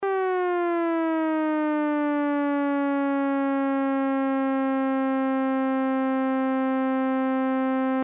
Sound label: ADSR Mod Env; synthese sonore les enveloppes